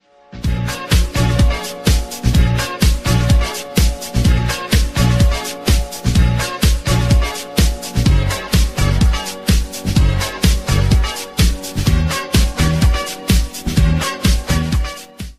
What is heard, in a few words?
Instrumental